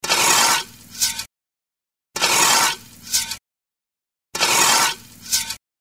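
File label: shovel scraping sound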